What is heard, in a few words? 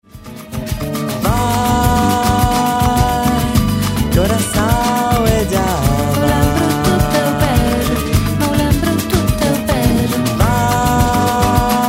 batucada; Aeroporto Batucada